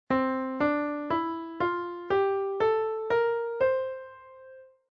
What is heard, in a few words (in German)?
Tonleiter C Mixolyd; Weitere Skalen; Tonarten